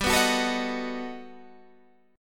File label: G Augmented Major 9th, Guitar, Half Step Down